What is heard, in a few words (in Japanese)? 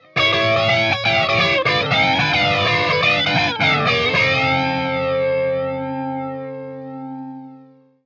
フランジャー